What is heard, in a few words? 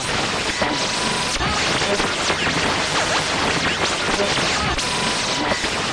radio noise; Radio Tuning Noises